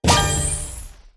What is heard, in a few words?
Goal; pinball; Mummy